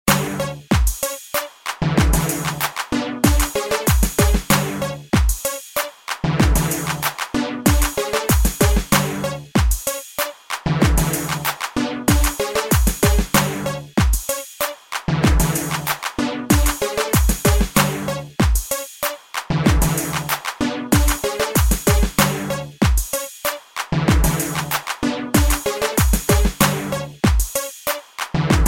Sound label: Simple Bass